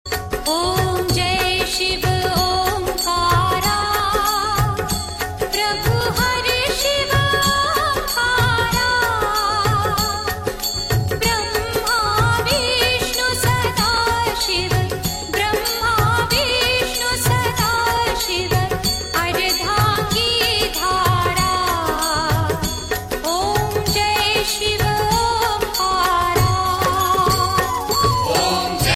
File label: shiv aarti female ringtone